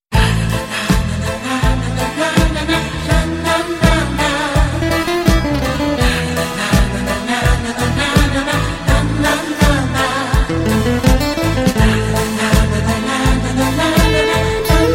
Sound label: retro tone, ringtone